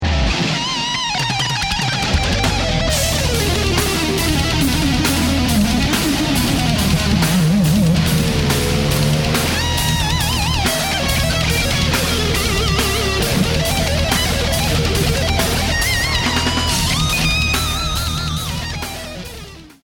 lead

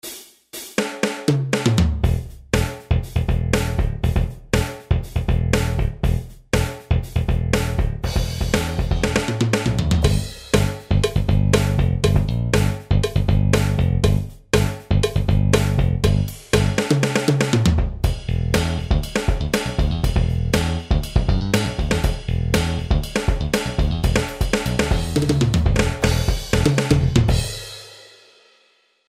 rock jam

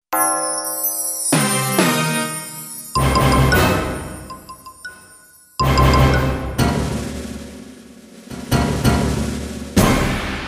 spd 20 orchestral

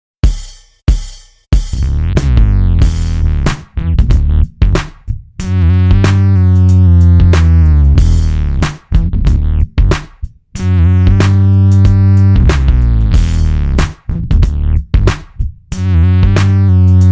me-20b patch; Synth bass sound, doubled an octave below.; ME-20B Bank 5, No. 3

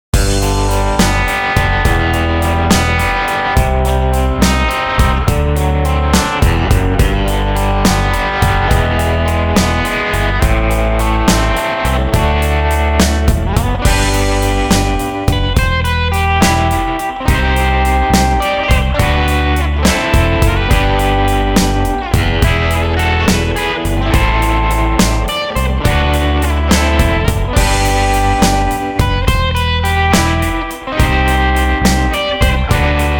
surf tremolo

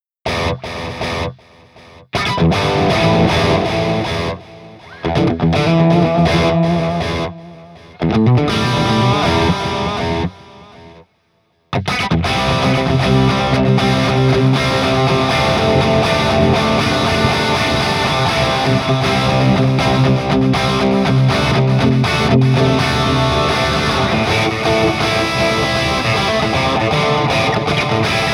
Theatrical Rock

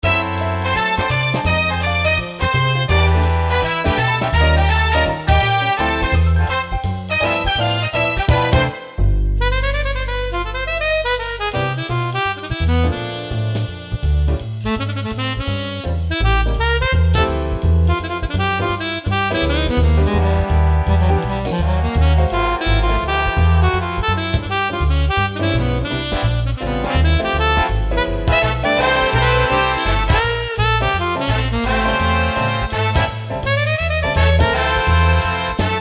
voice tone

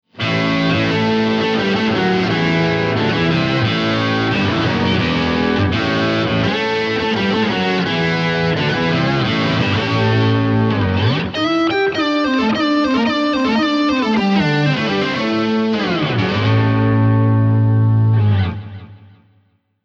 a-dist overtone

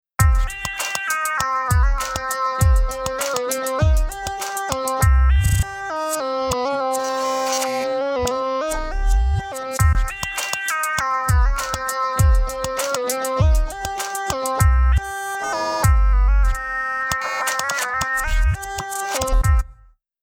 v synth xt mystic rap

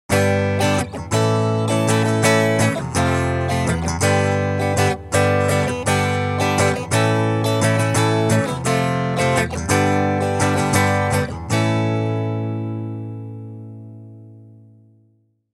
acoustic reverb; cube 60

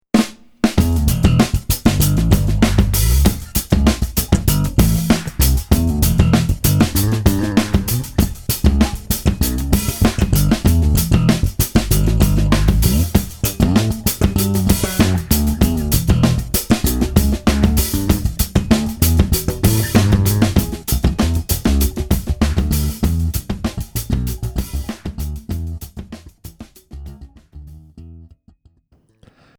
bass funky

